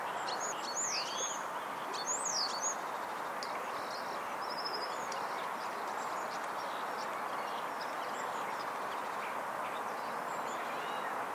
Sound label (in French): étourneaux grives litornes